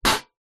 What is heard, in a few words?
household bbq hob lid metal close; zapsplat